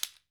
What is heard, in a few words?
AFRICAN, African Perc